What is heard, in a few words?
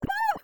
fear strong; VIVAE; vocaltrax